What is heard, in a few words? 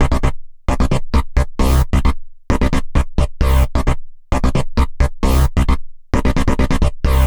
132 House Bass Arp Cmaj; Dance DJ; Digidesign; Pro Tools; Pro Tools Essential Loops